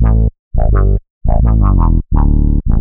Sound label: Bassline 85 A, hiphop, 85bpm, Bass; musicradar